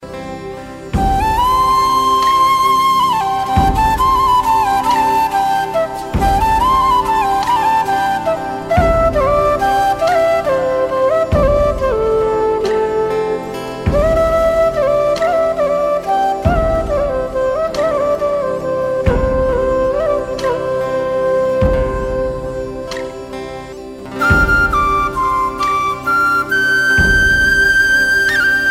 Valley Folk

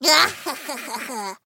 Ahahaha; HatKid

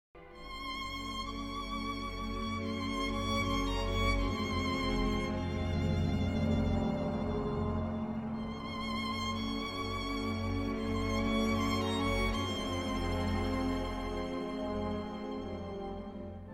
Sad Violin

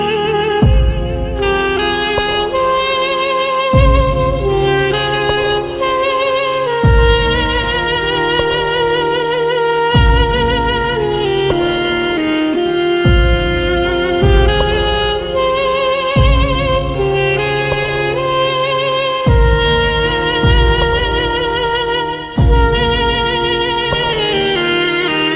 Sad Ringtone

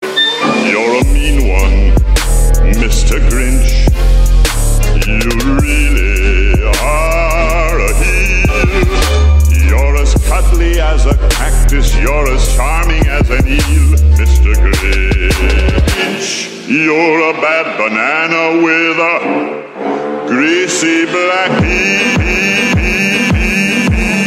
Trap; Grinch

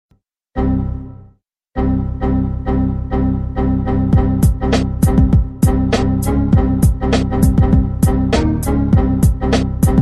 Windows Error Remix

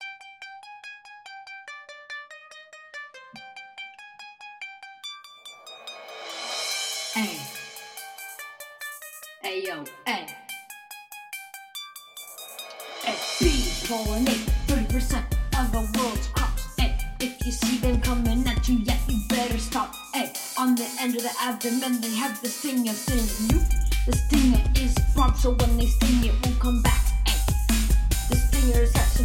Bee Rap

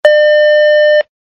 Formal Business Tone

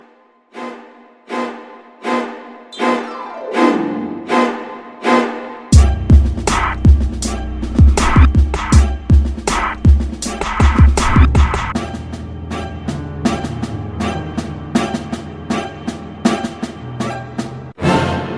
Lyrical Horror

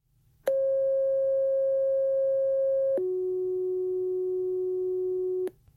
Fire Tone

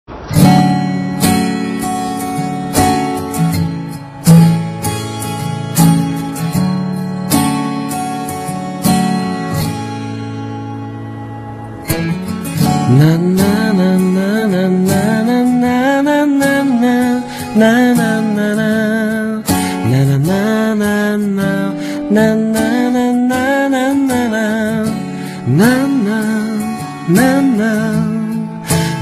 Humming

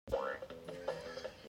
Crash Racing Error

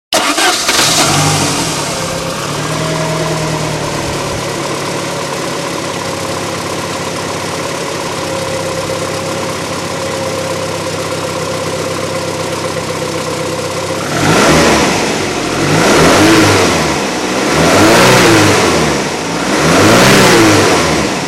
Saab Turbo; 93

